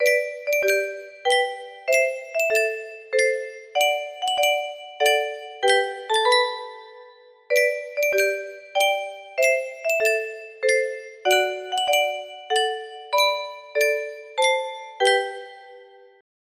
yunsheng music box, melody

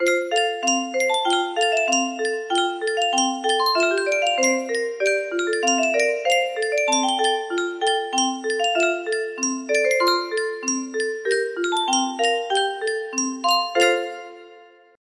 yunsheng music box unknown tune

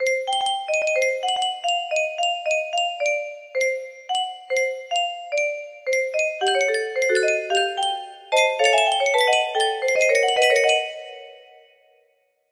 melody

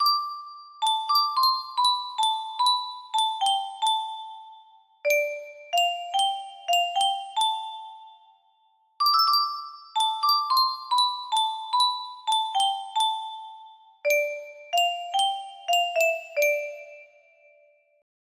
Music Box Theme, music box melody; tuck everlasting

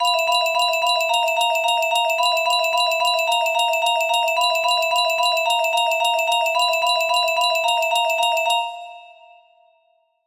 music box melody

